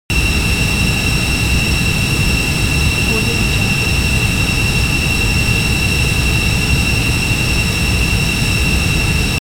transportation jet military b52 stratofortress turbine